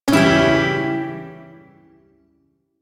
Phrygian Chord E; Warframe; Duviri Shawzin

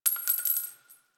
AK47 Shell Casing; Warframe; Tenno; Weapons

